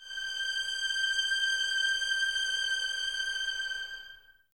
G 6, 11 Violins, Soft; Miroslav Vitous

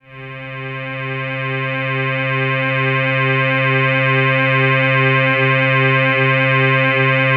PAD C4 L, Jungle; USB Soundscan vol.05 - Explosive Jungle; AKAI

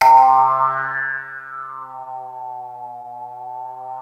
KEY FLANGE; Rubber Chicken Software Sample Bank

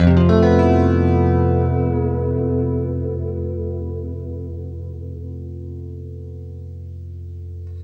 FLESH CHORDS, Guitar; East West; Steve Stevens Guitar Samples Collection

